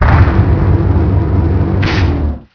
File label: Hatchdoor